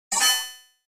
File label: Elect Bounce Wash, multimedia element